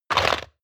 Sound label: crab movement cracking